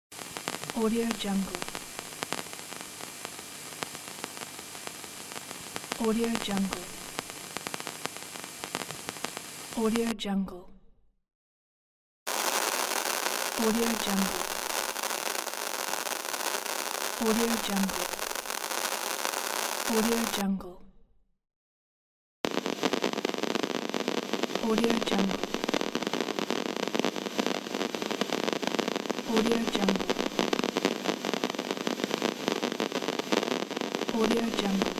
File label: long radio noises pack